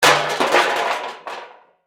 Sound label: Crash Metal Object, Crash, Metal, Objects; Crashes (Sound Effects:Objects); SoundEffects-BlastWave